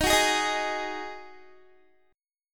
D sharp Minor Double Flat 5th D sharp, down, Guitar; strummed; 4F,4A,5C,5G,5C,6E; chord